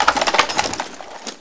RPG draw; svencoop

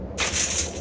fence rattle; canal; svencoop; hl2

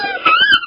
poison crab idle; svencoop; hl2

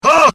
scream; svencoop; voice commands

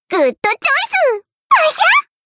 cheer; svencoop; voice commands; hl Nozomi

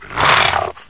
leap 1, fast zombie; svencoop; hl2

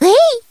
jump; svencoop; player; hoshino

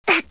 bite; svencoop; player; hoshino

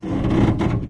metal stress; svencoop; hl2; ravenholm

